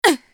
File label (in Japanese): 被ダメージ; カルヤライネン